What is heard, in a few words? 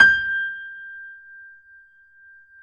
G 5, Keyboards Acoustic, GRANDP ST; USB Soundscan vol.17